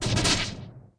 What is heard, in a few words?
Dark